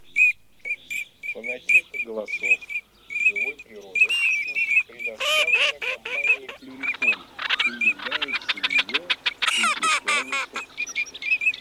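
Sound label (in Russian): Чирок-свистунок Alzavola, манок; plurifon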